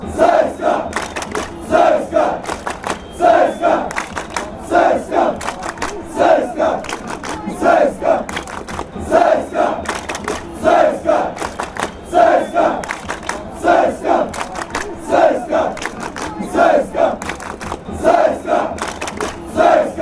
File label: claps, fan chants; cska